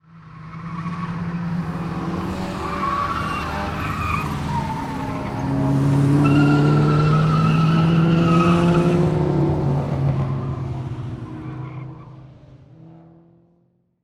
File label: Transportation Car Race Car Drive By From Tower Multiple Cars 180D